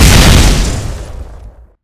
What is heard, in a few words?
rocket direct hit explode; tf2